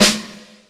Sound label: short reverb snare sound A key, acoustic snare sound tuned to the A note